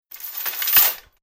Chain Impact Drop Chain; LYN103 package of Chain Sounds; Metal Sound Effects; LynneMusic

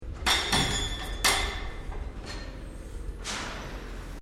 Construction Mobile Jackhammer Single Strike; Various construction machinery

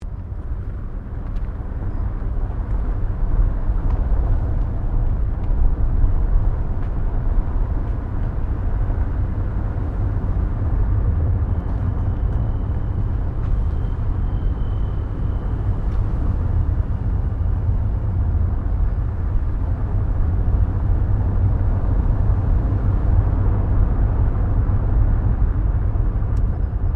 CAR SUBARU LIBERTY EXTERIOR DRIVING SLOWLY; Family members Subaru; Subaru Liberty 2001

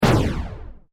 Science Fiction Laser Gun Single Shot; Lasers

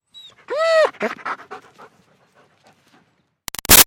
Dog German Shepherd Whine Passing By; Animals; Dogs

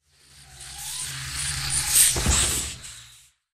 Sci Fi Energy Beam Pass By Zap Electricity Bolt; Laser; Blastwave

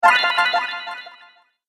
Santa Vanish Spell, magic

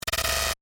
Static Short, Production, Element, Static, Short; Sound Design; BlastWave

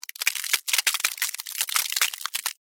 Ice Crack Movement, Scifi; Science, Fiction

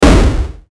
Hit Rumble Digital; Blastwave

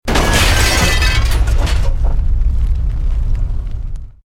EXPLOSION MEDIUM METAL GLASS

